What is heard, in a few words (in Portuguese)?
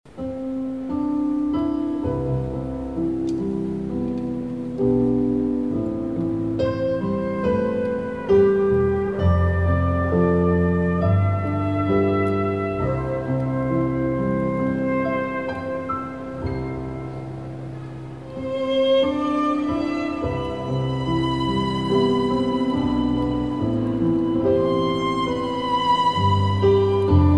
Piano e Cordas